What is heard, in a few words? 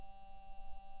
mic, mars2020 edlcam